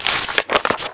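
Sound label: book page; The Elder Scrolls III Morrowind GOTY; XBOX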